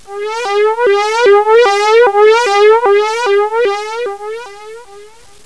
SIRENA; cyberaz

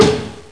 CAJA LLENA; cyberaz

